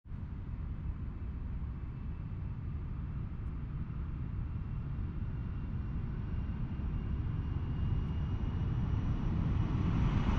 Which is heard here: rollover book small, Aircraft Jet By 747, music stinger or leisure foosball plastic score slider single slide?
Aircraft Jet By 747